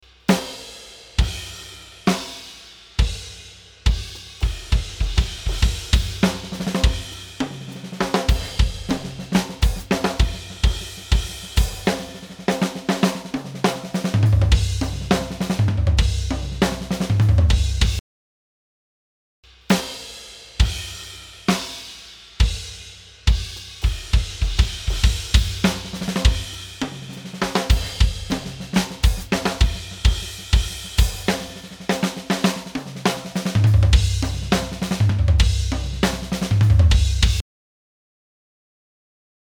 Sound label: drum buss tight light comp